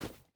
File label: footstep hide snow run; Rust